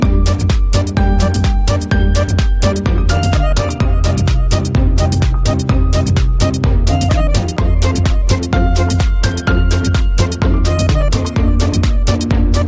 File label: Adagio In G